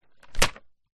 foley paper sheet a4 slam down by hand on wood table